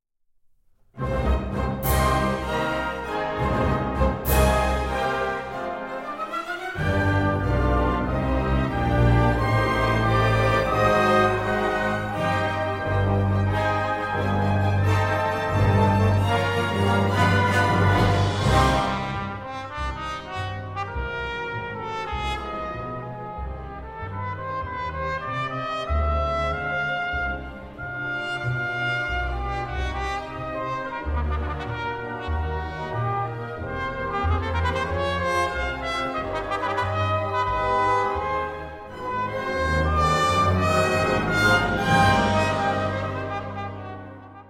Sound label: Trumpet Concerto in C Minor, Allegro con Fuoco